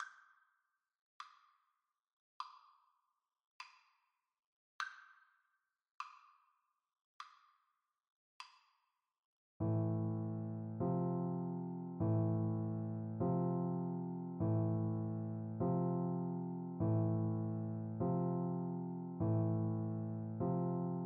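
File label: chopin funeral march, alto saxophone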